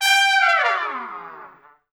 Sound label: Pop Brass; Best Service; ProSamples vol.19; AKAI